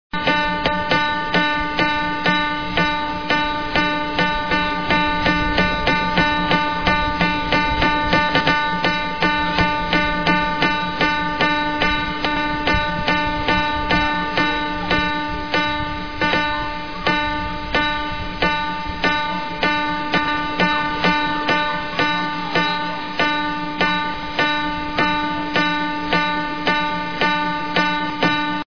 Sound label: dulcimer